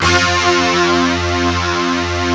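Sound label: distort, Fairlight; East West; Global Sounds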